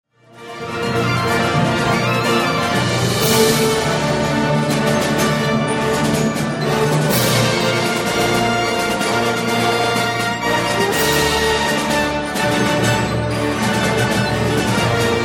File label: Fanfares Celebrations